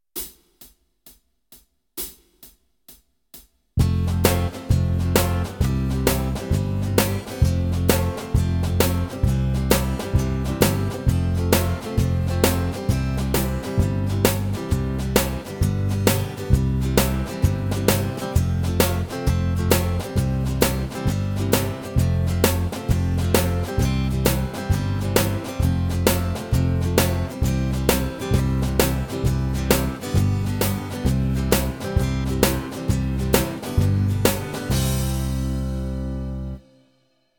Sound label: Backing track; Kenneth Baker - The complete keyboard player